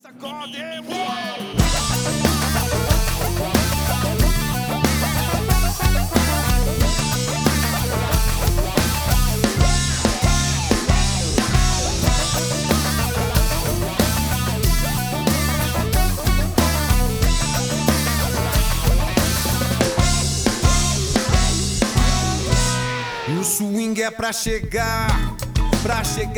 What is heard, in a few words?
Samba Rock; masterização analógica